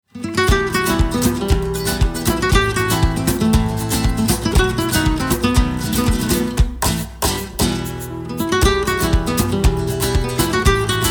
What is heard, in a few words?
cafe rumba